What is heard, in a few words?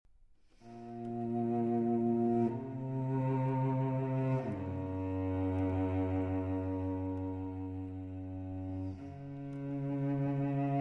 String Quartet No. 6 in G Major; Shostakovich String Quartet No. 6 in G Major, Op. 101